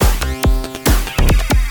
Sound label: Attack Loop 140 Bpm